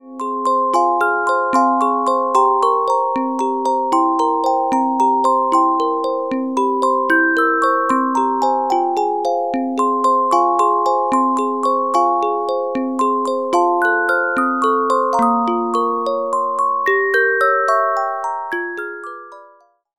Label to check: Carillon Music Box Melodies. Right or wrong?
Right